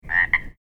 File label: sierra solo